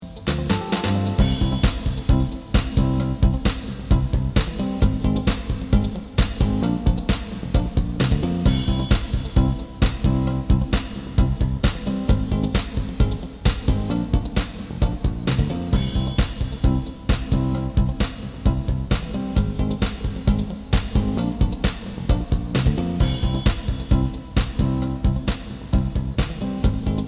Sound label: background music softer